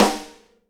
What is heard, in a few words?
SNARE RIM, Live Snares; PlatinumPhattCD1; E-MU Producer Series Vol. 8 – Platinum Phatt